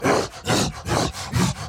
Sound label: Enraged Breathing Loop; The Town Sam Clip Burns; Devour 2021 gamerip pc macos